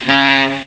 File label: sax fart; Horns and Brass